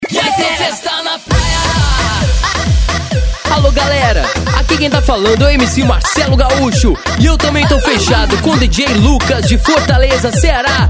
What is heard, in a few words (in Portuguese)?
eletro funk; cd balada eletro funk vol4